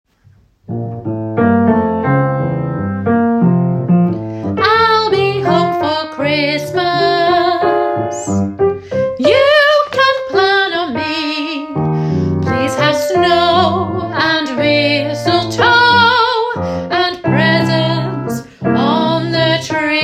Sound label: ill be home alto